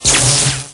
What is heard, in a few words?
tesla zap